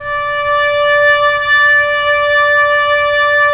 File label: Oboe; Roland - LA Composer vol. 4